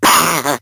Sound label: pain; Awesomenauts